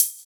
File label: Hhat, HiHat; Equipped Music; Loungin House